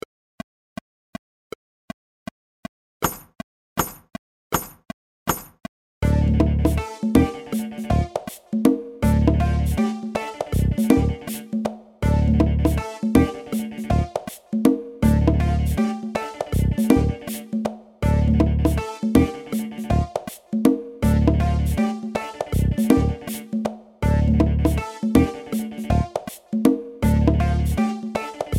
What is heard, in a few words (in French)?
break lineaire loop